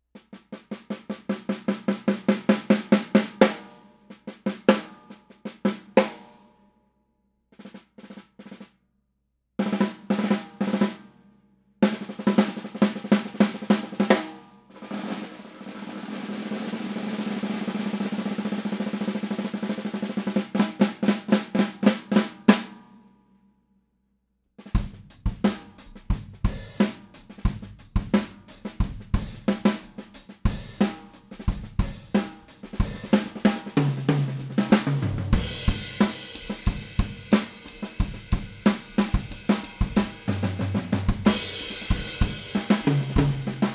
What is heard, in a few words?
Poplar Wood Hoops; Craviotto